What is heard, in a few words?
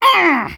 Soldier taunt exert